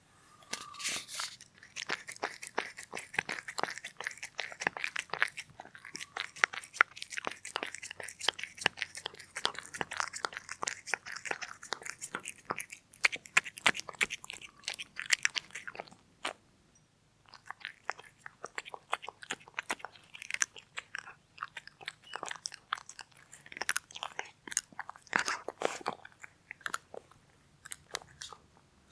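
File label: cat food; nekoesa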